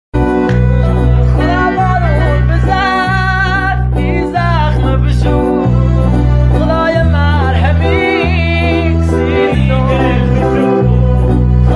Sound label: Guitar